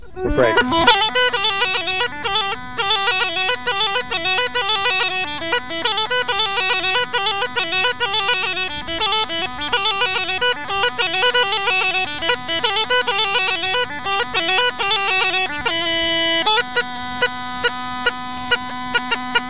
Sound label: Rodope Gajada, BREAK; Dimitro Sino Dimitro